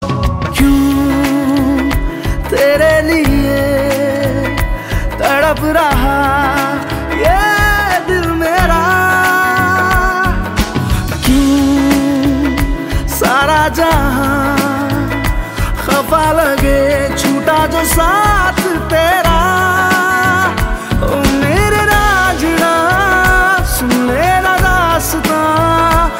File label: Hindi Ringtone